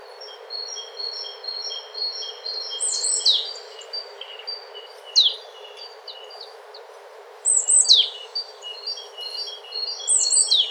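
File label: sounds of spring forest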